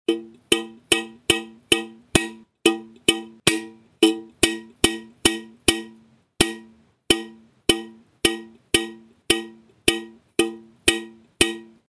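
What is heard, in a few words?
jars sound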